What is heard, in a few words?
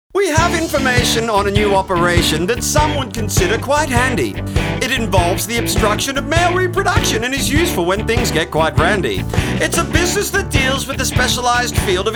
jingle